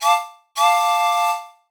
Steam train Horn

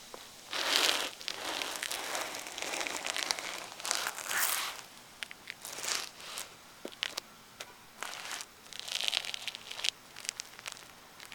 Stab Sweetner

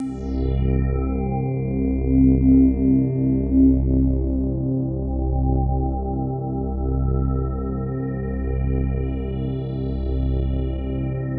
Deep Slow Pad

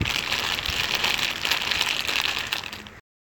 Paper bag

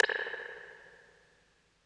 Rim

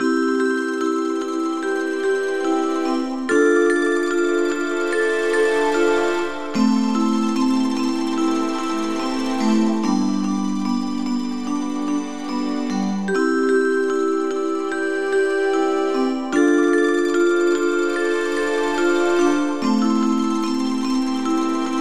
Crystal

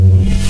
Boom Sizzle

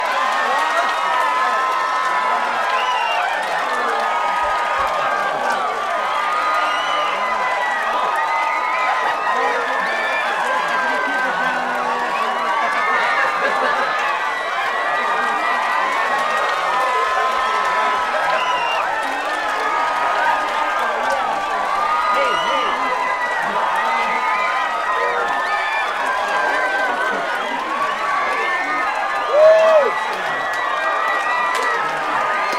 Crowd Partying Cheering Applause All Around

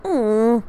Frustration

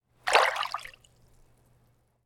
Water Swirl Small

